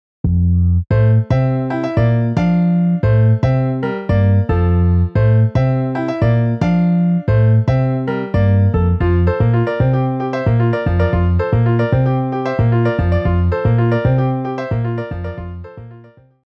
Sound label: Piano Bass MIDI